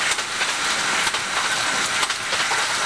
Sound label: Run, Train; OpenBVE